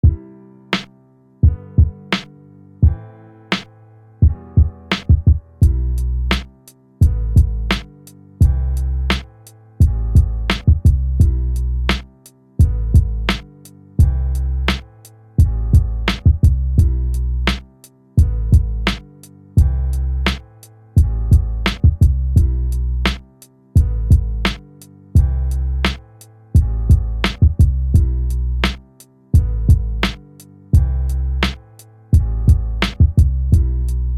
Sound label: instrumental rap beat; REP MINUSOVKI minus